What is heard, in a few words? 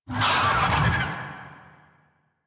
Infested Big Ashen Vocal Attack Death; Warframe